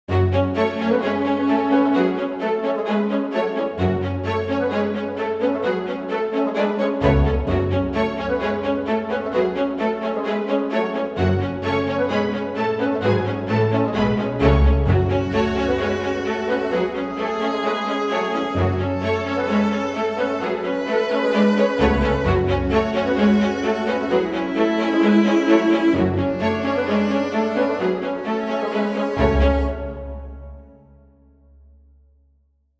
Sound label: run 130bpm